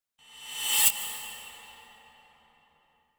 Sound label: Whoosh Metallic Thin Attention Grabber; Hollywood Edge; sound designer's toolkit v2